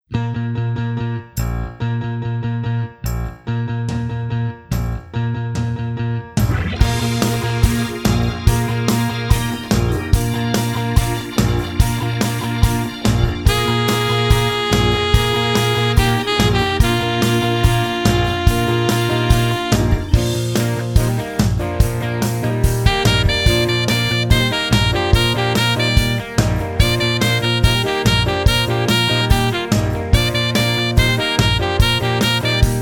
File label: - A Sax, 薩克斯風伴奏